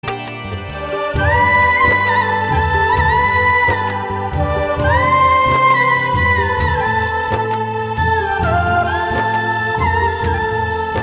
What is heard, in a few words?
jay garvo girnar instrumental ringtone